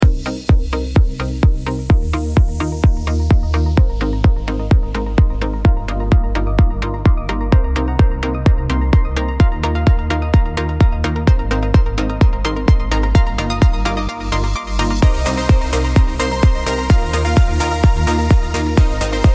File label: uplifting electronic anthem